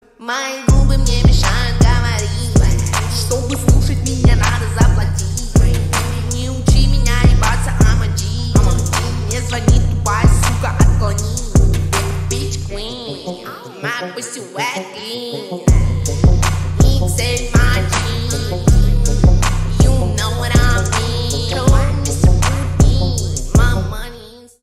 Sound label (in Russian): slowed reverb